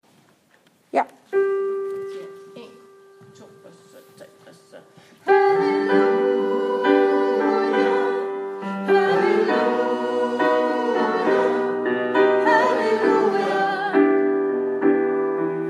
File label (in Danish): halleluja sopran